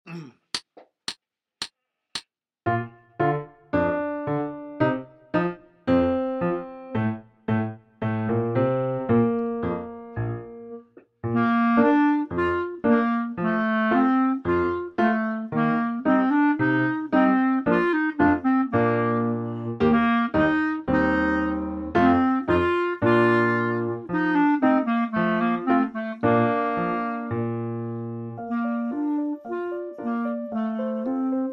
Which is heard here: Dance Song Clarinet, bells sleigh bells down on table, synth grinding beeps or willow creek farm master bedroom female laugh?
Dance Song Clarinet